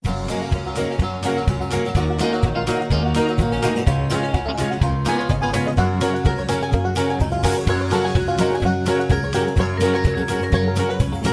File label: High Key